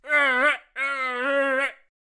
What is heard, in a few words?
Bank Robbers Attack Vocals